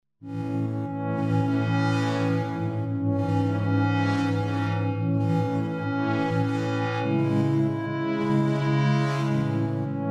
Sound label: vector square, yamaha tg33